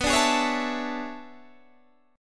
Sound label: E 13th B